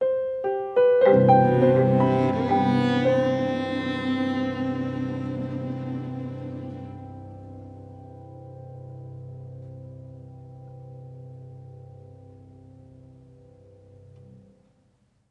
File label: Music Level Argument Ending